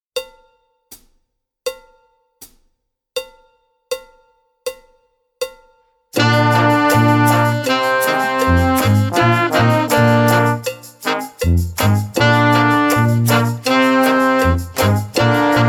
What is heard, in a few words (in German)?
Reggae Melodie ohne Drums